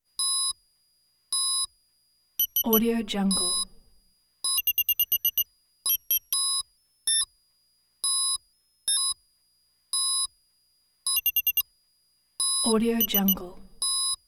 graphics or telemetry

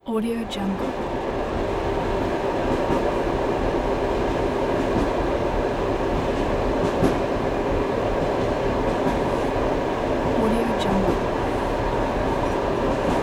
train interior slowdown

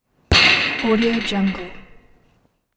metal scrap rattling

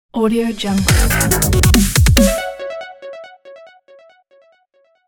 clean dubstep logo

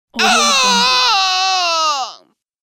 male creaky pain shout

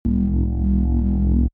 science fiction space inside alien ship

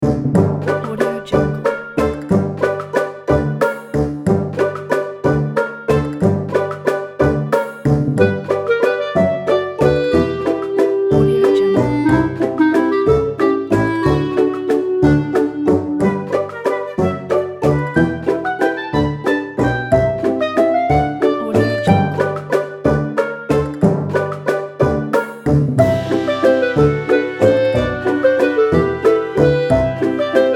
a funny quirky waltz

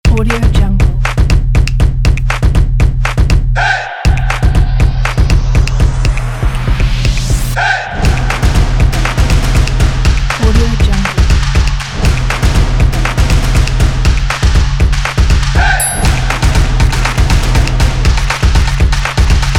drumming

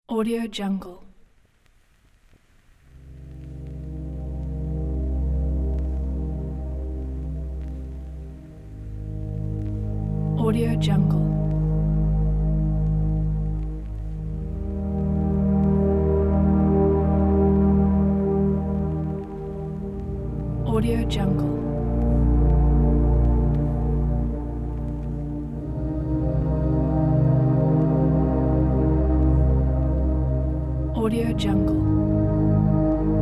emotional dramatic build up ambient

